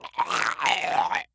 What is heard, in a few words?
die zombie; cstrike